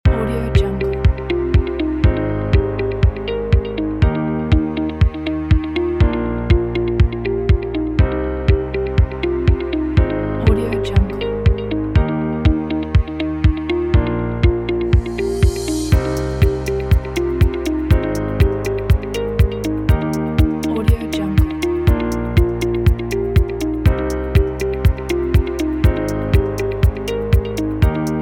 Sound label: happy inspiring